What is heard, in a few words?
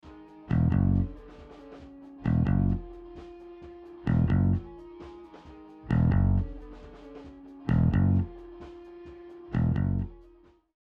Separation Solo Bass; Recording Mistakes